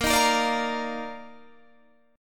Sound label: D sharp Major 7th Suspended 2nd A sharp